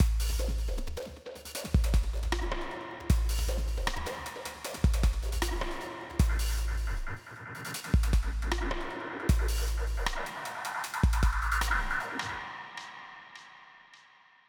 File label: LOUD ROCK SEQUENCE TRACK